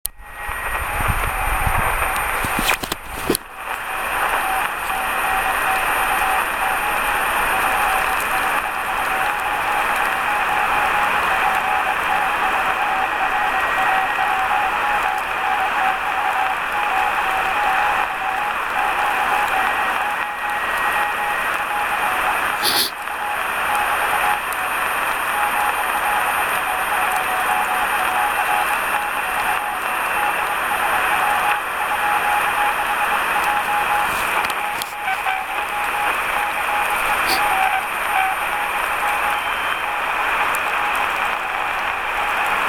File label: DSB TRX QSO QRP